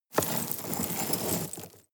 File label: sweep small rocks soft